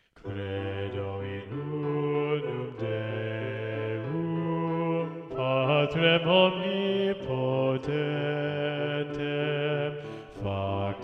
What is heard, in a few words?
Bass; Mass No2 in G Mvt 3 Credo; Practice Room